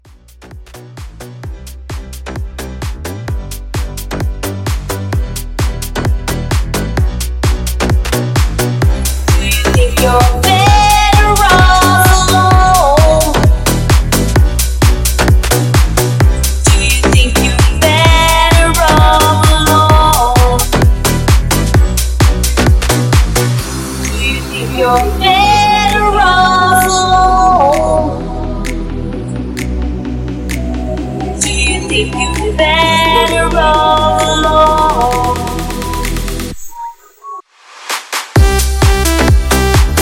Mashup 130 Clean

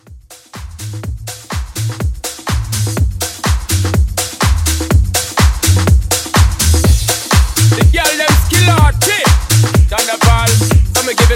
House Edit 124

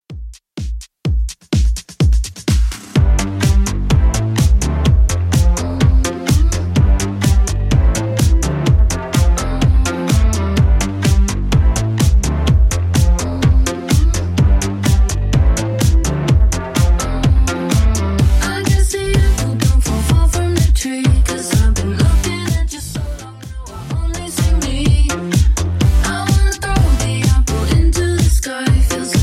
Top40 Redrum 126bpm

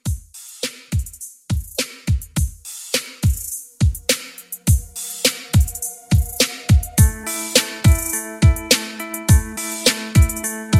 Re Drum, 104

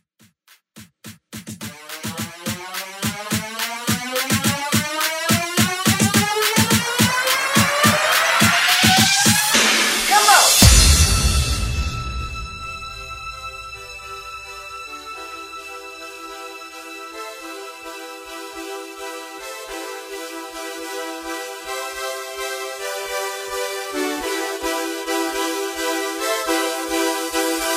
Twerk To House Transition

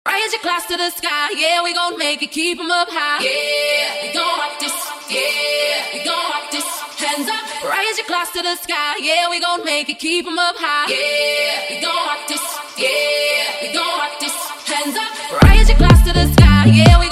Acapella Breakz In Out 125 Bpm

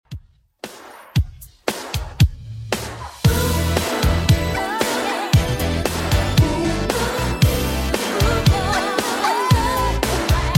80s Redrum 115bpm

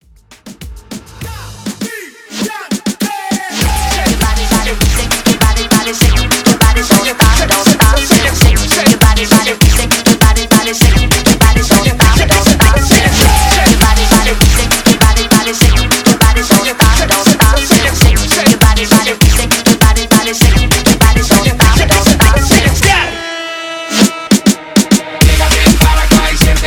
Reggaeton Break Acapella Party Intro 100 BPM

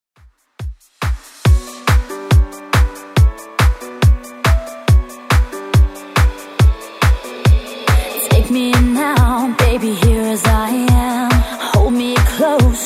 90s Redrum 140bpm

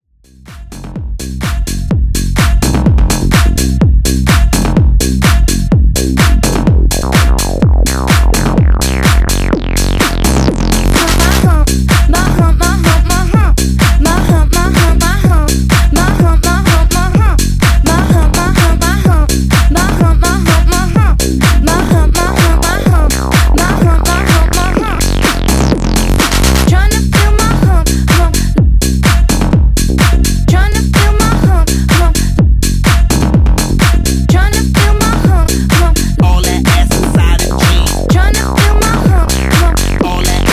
Bass House 126 Bpm; djyan

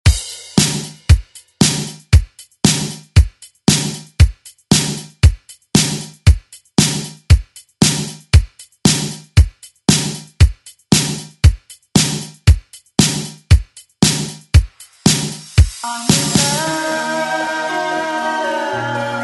80s redrum; DJ Mhark

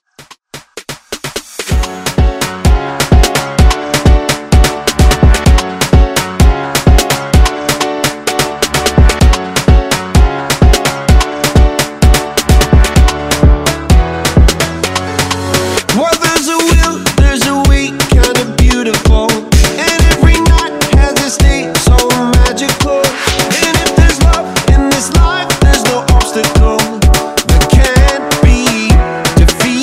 Afrobeat Redrum

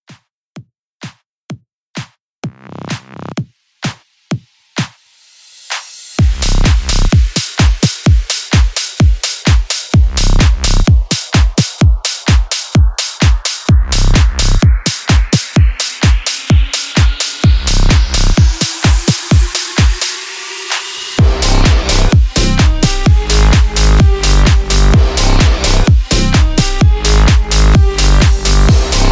House 128

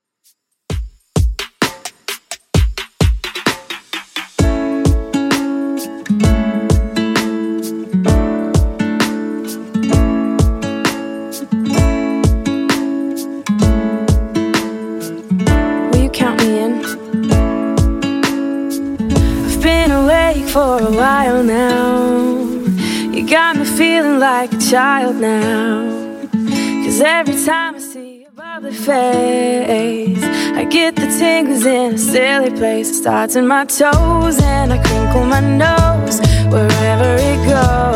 2ks Redrum 65bpm

